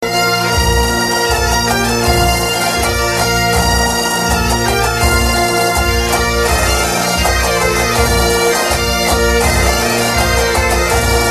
GAITA

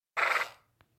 Horse

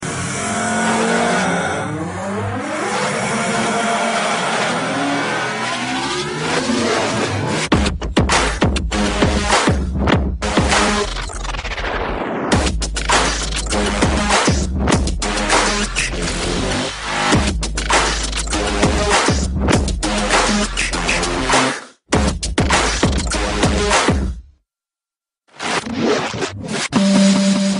Dubstep Mix

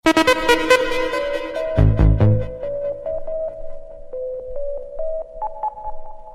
Techno Msg

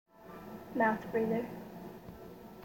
Mouthbreather

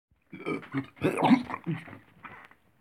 Gross v***t

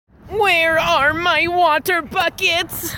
Water buckets

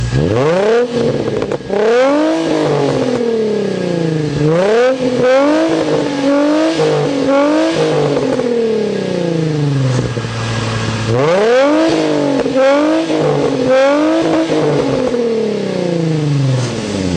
Nissan 350 Z

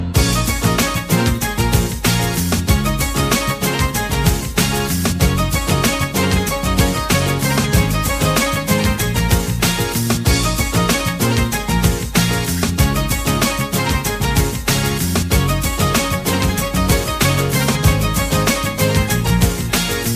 Balkan Track

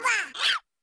Chihuahua

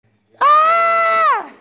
Scream; Trixie

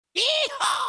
Yeehaw

